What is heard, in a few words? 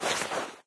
clothes rustle; taunt; player; yeti standee; sniper; source engine; tf